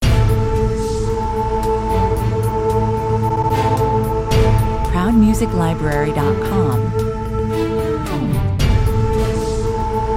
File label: glitch benedictus